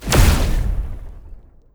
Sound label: imp thunder